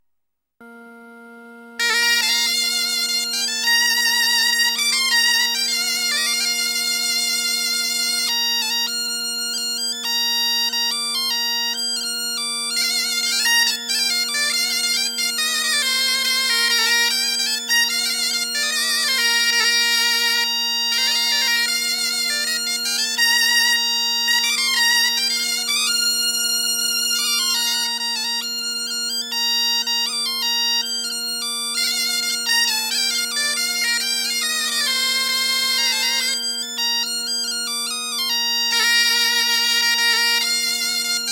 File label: Gavotte Bal